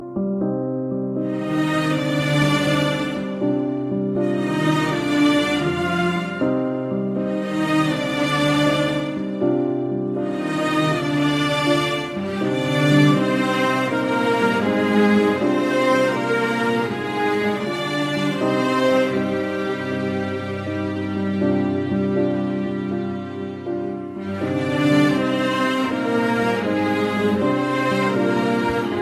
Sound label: violin and piano; Electronica